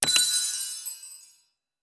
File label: SFX- Party Heal; Legend of Grimrock